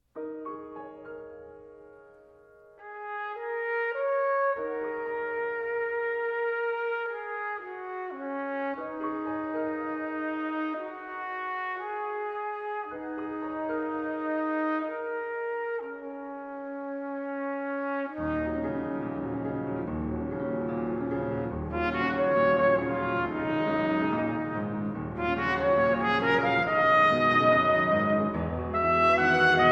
Sonata for Trumpet and Piano, Lento - Allegro Molto; Music for the Soloists of the ABQ